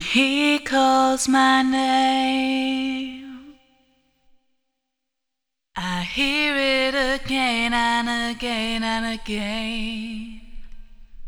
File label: Vocal 3 way EQ panned; Conquering EQ